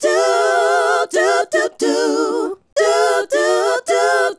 Female Group, Hip-Hop and RnB Vocals

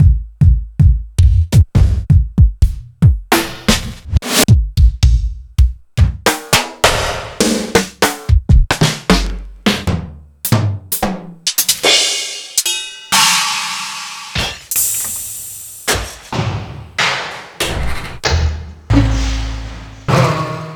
Korg Triton Modern Rock Kit

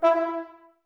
BRS TBS E, Woodwind Brass Ensemble, Brass; Miroslav Vitous - Woodwind Brass Ensemble

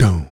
Voice Tom; Roland BK-5; Vocals

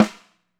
DEAD TUNE, snares; Sampleheads - New York City Drumworks VOL-1

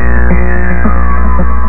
ding ding; Amiga Soundtracker Sample Packs

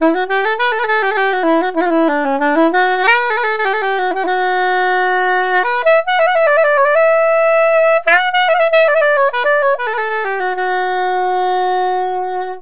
THA SAX; USB Soundscan vol.63; AKAI

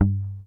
P BASS MUTES G2

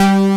BASS, Synthsonic; Pro-Rec - Synthsonic; BASSES

